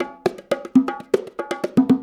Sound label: Bongos, 70s Analog Funk; Sonic Foundry (Sony Creative Software); What it Is! 70s Analog Funk